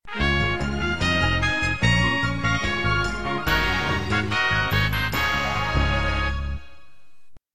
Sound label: Fanfara; SSB64